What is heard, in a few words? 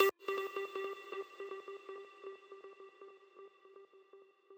arp